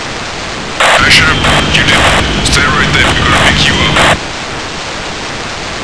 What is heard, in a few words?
heli call, chinook